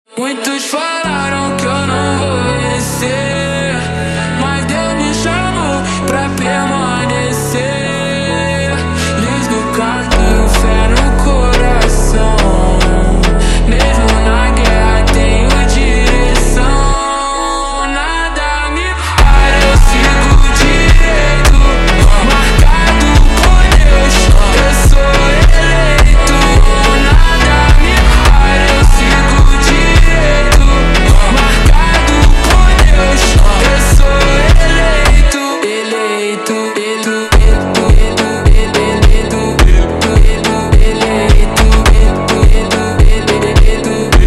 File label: Montagem, Super Slowed; فانک